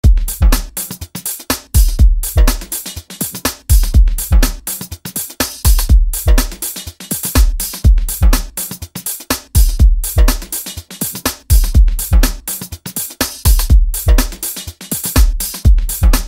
jazzin Lifestyle Jam Broken Beat Nu-Jazz

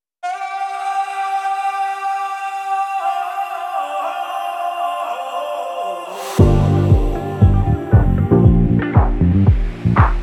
Youth Choir

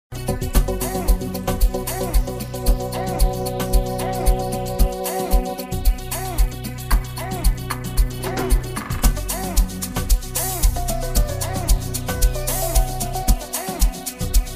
Amapiano Mix

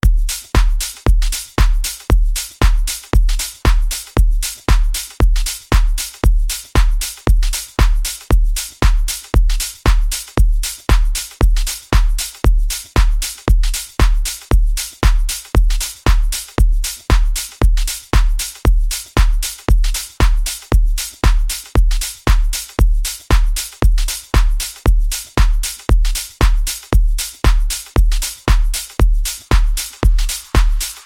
Groove Mix

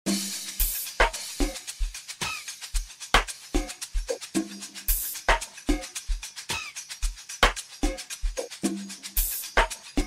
Drummo

